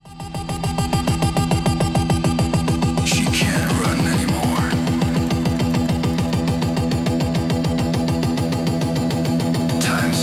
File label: hardstyle remix